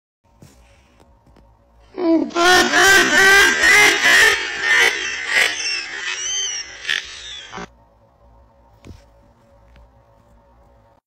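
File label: long laugh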